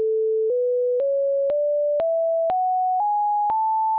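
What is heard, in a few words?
mean A do re mi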